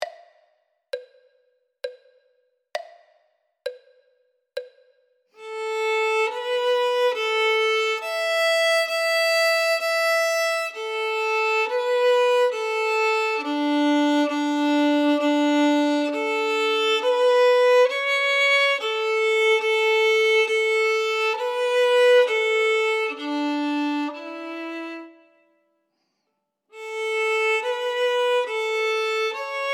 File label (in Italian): violino I